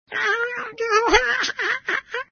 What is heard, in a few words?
crying; Little Bill